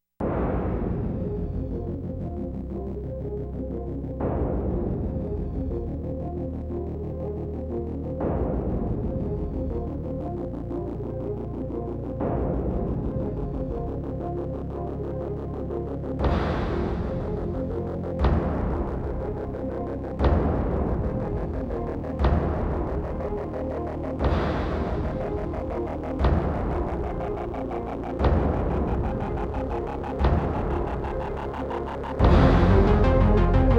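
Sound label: No Sub Bass No Drums, trailer music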